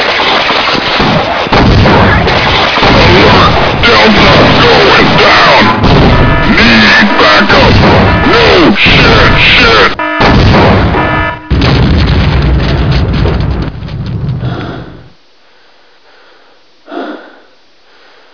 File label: impact chinook